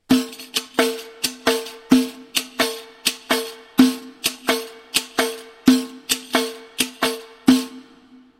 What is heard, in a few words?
riq